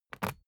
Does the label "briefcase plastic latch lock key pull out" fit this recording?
Yes